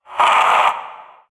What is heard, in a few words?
Vocal Pain; Enemies; Warframe; PriestQuest; Possessed Red Veil